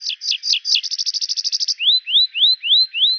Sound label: Bird, ambience